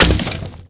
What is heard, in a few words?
wood fence post; obj wood fence post